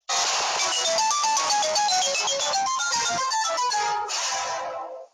reversed loud; gamecube

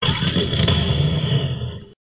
nitro fists air punch; fortnite

